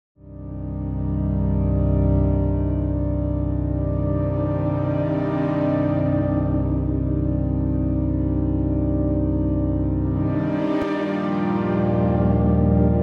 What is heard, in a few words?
Pad Aftertouch; sequenzen